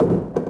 door; DAoC

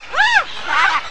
tucan

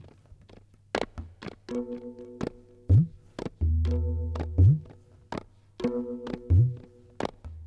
PERC LOOP ETHNO 125

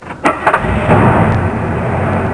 truck start

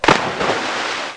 GOOD splash; PUZMANIA

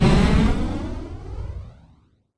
MSL BOMB; PC Gamer IT CD 47; USAF; USAFDEMO

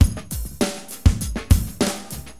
DM LOOP, HipHop, Drums

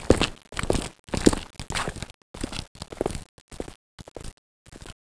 click

